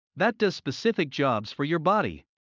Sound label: Heart; Classroom humanbody